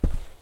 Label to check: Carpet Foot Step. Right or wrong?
Right